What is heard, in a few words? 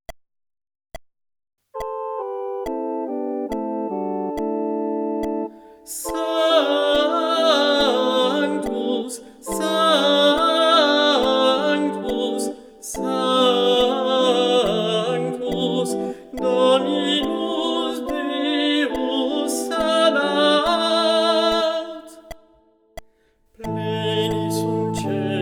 Sanctus tenor